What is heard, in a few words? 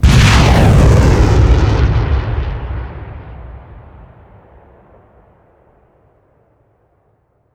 trail, rocket; gmod; arccw uc commons attachments